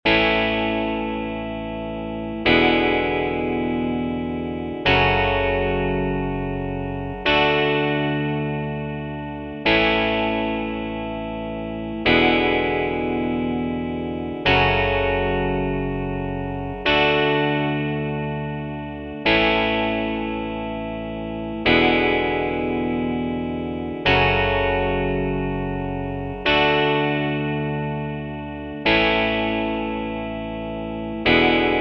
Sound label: Country No Drums; Tryout track; 2027 MMEA conference All-State Collective Ensemble audition